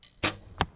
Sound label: Bow shoot